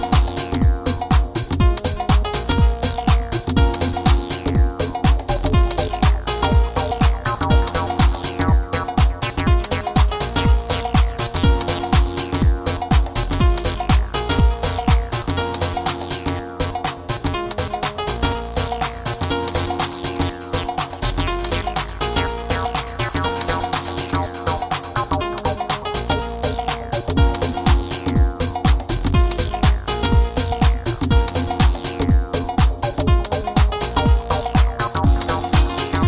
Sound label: Piano Trance